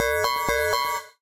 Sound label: Alert; DBM-Core; BlakbyrdAlerts